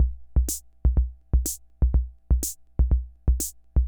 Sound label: Ace Tone Rhythm Fever Loop